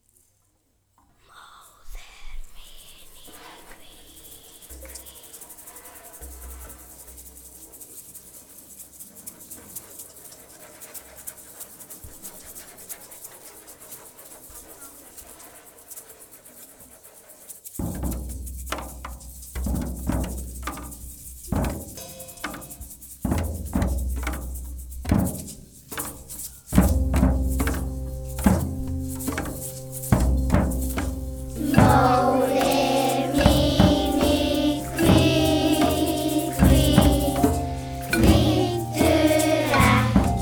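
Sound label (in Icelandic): Móðir mín í kví kví; Modir min i kvi kvi; Verk nemenda; tonmennt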